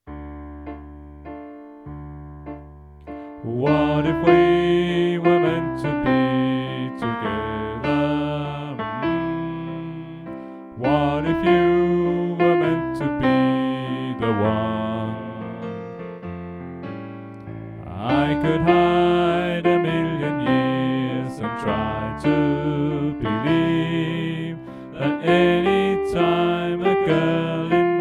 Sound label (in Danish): Sopran; oevefiler